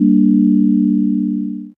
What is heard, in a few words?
game over; watertowerclassic